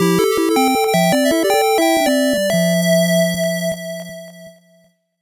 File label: 8bit jingle slow